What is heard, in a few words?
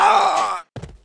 die old man